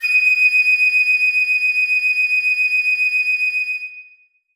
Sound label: piccolo d#6; Sonatina Symphonic Orchestra